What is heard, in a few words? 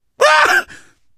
laughter, survivor voice